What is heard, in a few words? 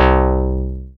MID BASS, SYNTH FUNK; Zero-G; Funky Elements